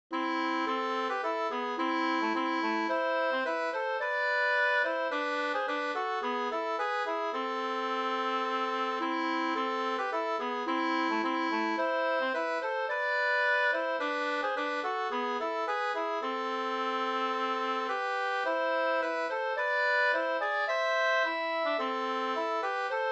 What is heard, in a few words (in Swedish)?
gånglåt